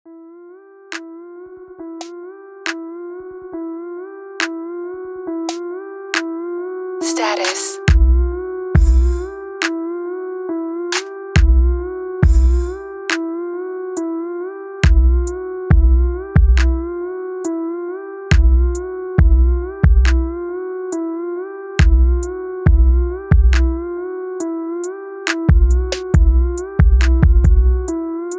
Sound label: trap